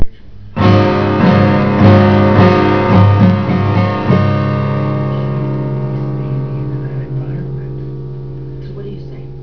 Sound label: Strumming